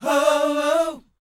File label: SHOO B E, R&B Dance, vocal; Spectrasonics Vocal Planet